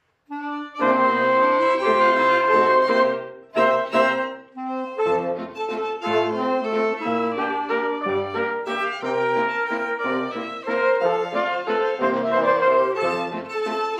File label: Waltz